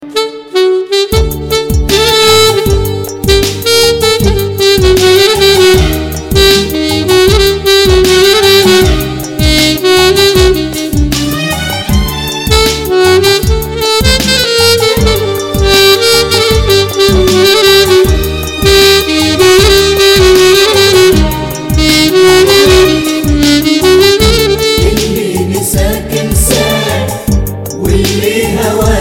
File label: Arabic Muisc